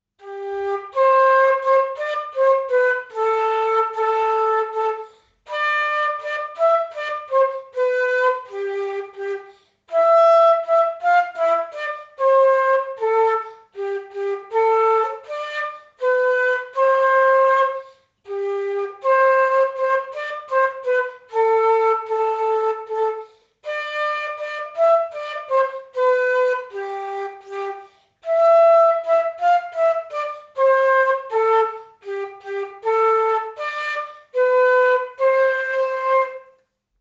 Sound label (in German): We wish you, Querfloete